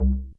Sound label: SUB BASS; ZERO-G; Datafile